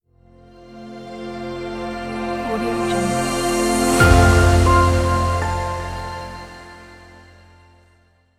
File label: the revealing music logo; wolf-music